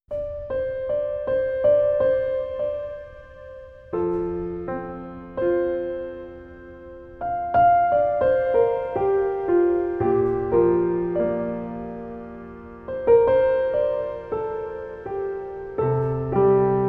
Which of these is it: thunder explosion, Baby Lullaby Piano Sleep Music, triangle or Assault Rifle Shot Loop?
Baby Lullaby Piano Sleep Music